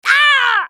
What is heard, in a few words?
dying; Kennen; Character Voices - English